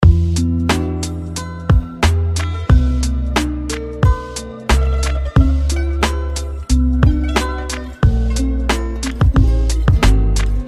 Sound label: Utopic Ringtone